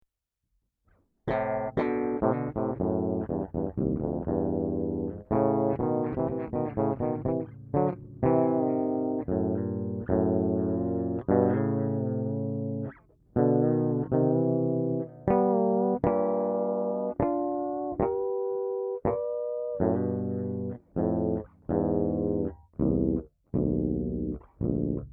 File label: harmony b3rd 3rd, whammy